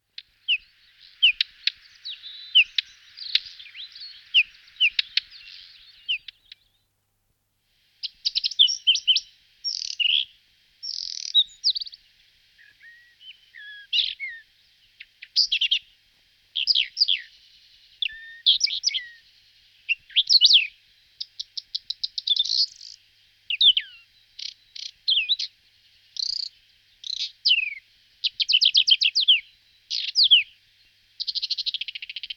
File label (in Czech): Brambornicek hnedy Saxicola rubetra